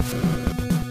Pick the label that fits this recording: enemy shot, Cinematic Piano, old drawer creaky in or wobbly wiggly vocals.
enemy shot